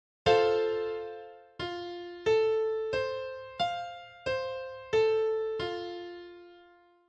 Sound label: F5 Grand Piano Triad